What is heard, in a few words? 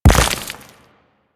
Step Surface Dirt, Mech; Step Surfaces; Nechro